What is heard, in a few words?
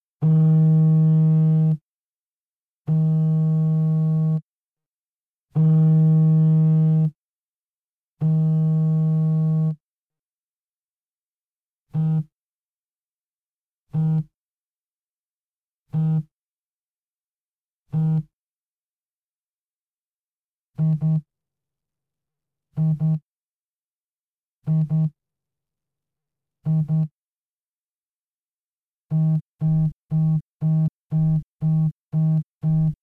Phone Vibrating